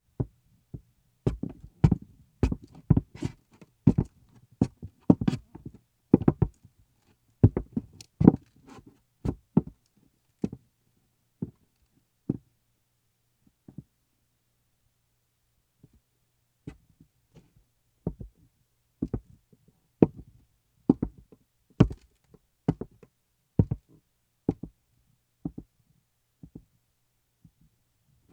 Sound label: Muffled Footsteps on Dull Wood; Foley; Foley Essentials